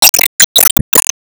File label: Warcry